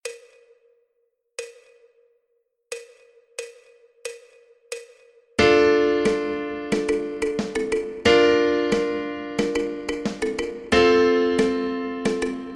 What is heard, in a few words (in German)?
Intro langsam, klavier